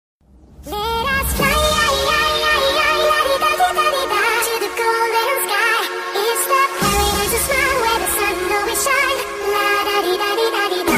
singing chipmunks; ringtones

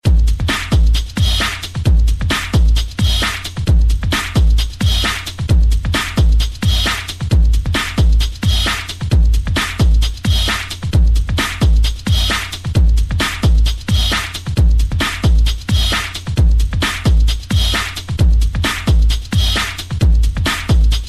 fat break beat; hip hop

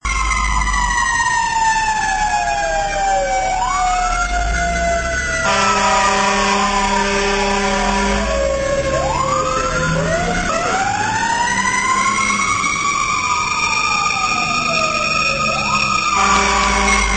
Federal Q airhorn

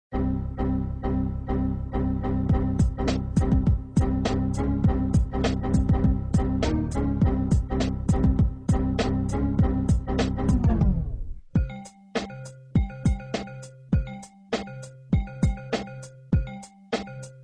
funny

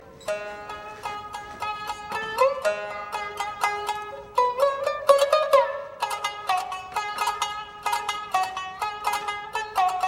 Shamisen SMS

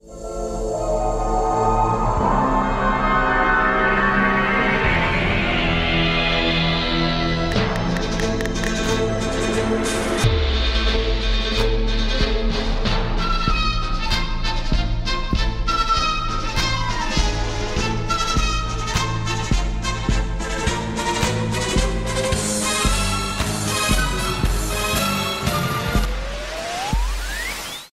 Trap, ringtone; Team Rocket

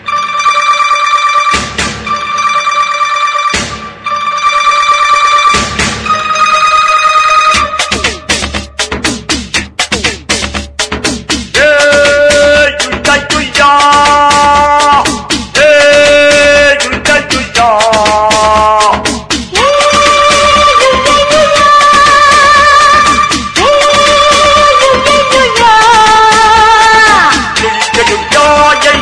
drum beat mix; dance

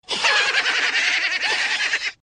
Cockroach laugh

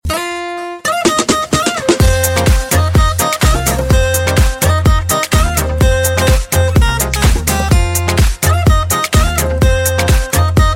best love music

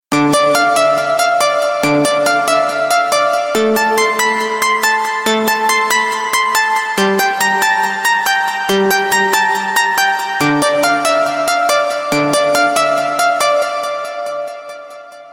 Trance Message

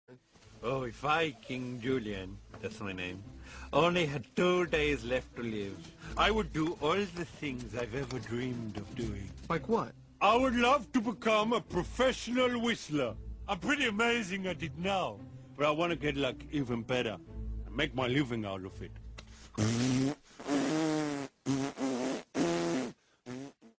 whistle; king julian

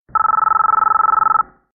Phone Msg; Matrix

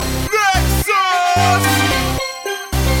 nexus 5 sms tone

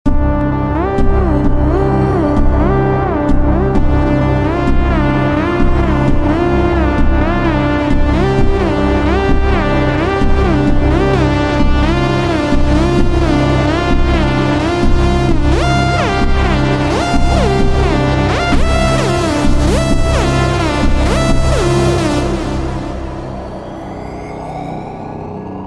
trancecore, ringtones, electronic

